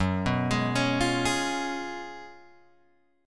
Gb Major 7th Suspended 2nd Suspended 4th Guitar Open G